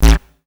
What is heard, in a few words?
dance, bass; Techno Trance Essentials